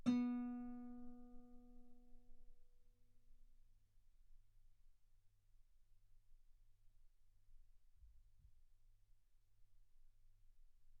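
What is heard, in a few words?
Guitar ff B string B3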